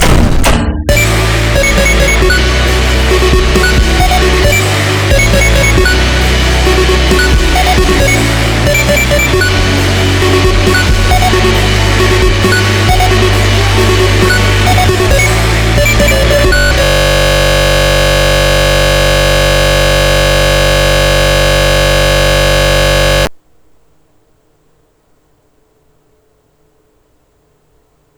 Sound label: sticky keys